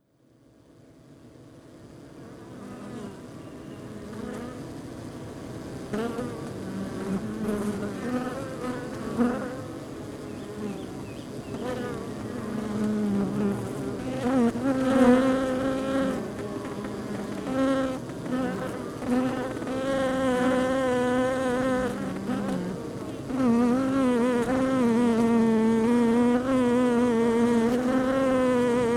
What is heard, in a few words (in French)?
Ruche Exterieur